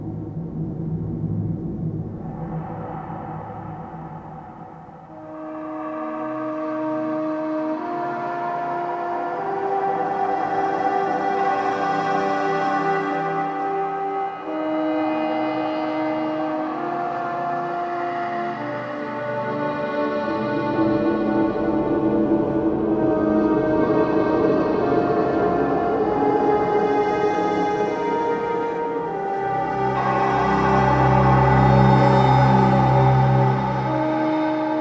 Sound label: Library Music Loop